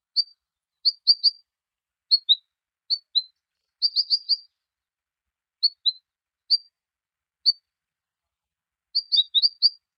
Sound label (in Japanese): benimashiko, bird